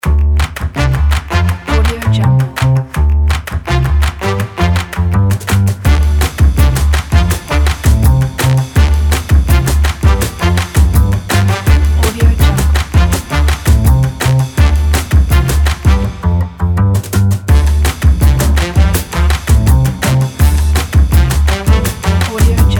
fun quirky retro upbeat kit

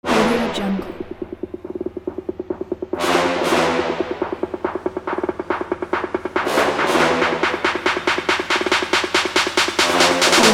on this trap

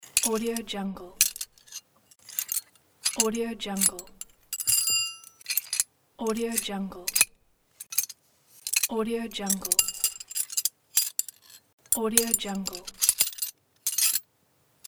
metal noise of wrench

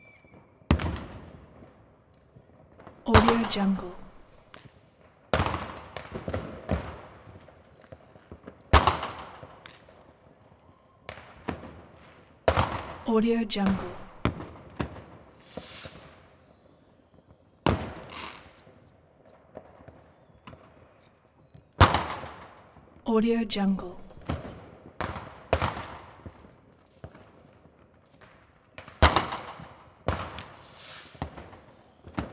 ambience fireworks street medium density; soundbits soundfx

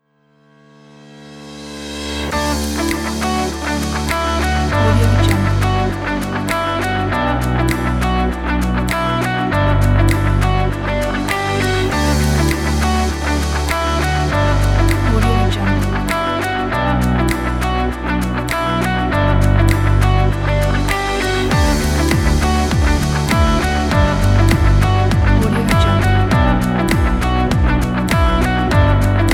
corporate upbeat

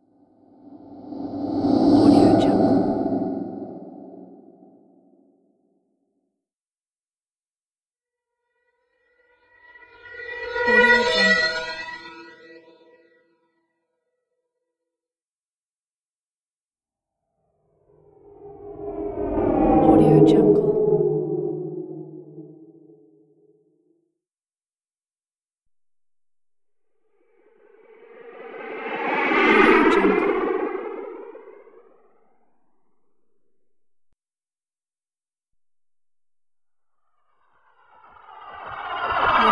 alien ship flying by; livingroomclassic

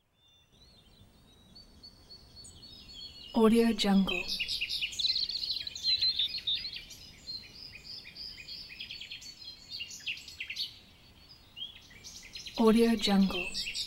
birds

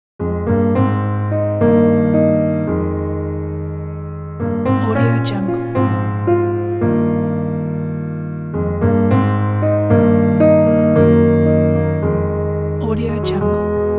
romantic piano theme

